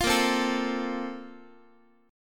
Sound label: A sharp Major 7th Suspended 2nd, Guitar; A#M7sus2; strummed; JGuitar